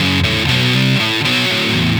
Electric Guitar, Loops; Guitars

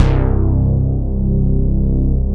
SYN BASS; SoundFactory

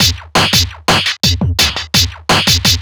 Old School Break, Drums

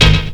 SYNTH; SoundFactory

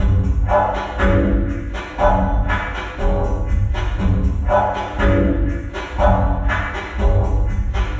Electronica Drumloop; Drums

